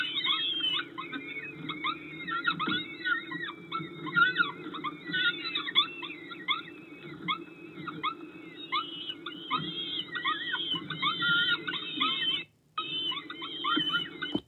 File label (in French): Pelerin jeunes nourrissage